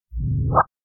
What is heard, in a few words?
Robot monster